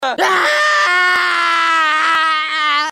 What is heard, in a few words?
scream, male; gmod; npc